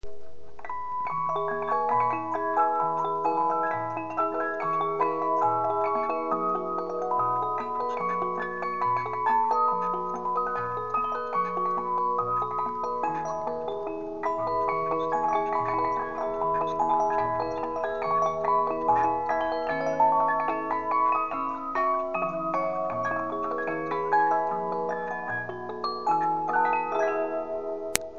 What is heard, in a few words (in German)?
originalsound heller walzenspieldose